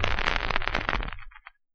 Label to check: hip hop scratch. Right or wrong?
Right